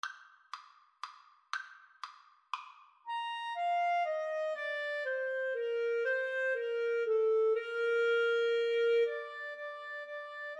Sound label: Menuett, Clarinet Duet